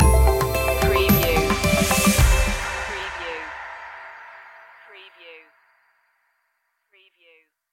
that feeling stinger

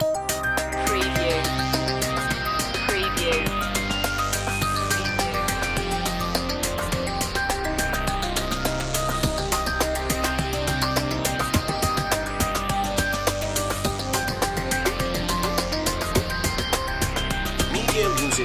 ambient breaks loop intro

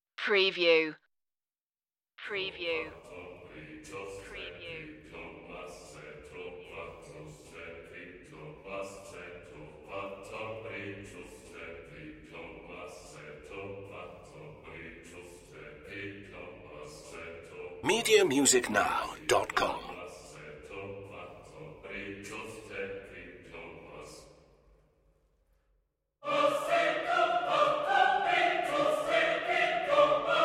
choir only

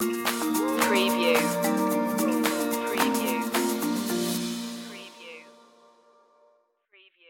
ride along stinger

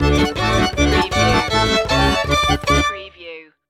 polka stinger

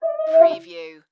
Glass Squeak Wipe

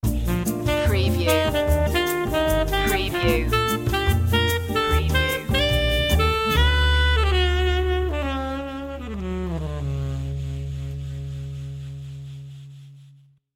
tambella sting

